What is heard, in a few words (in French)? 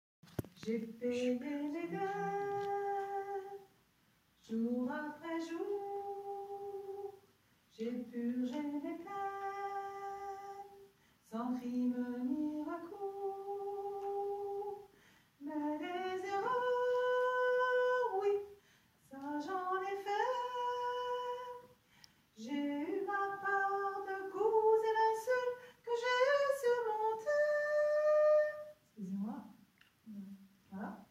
soprane, -; Groupe Vocal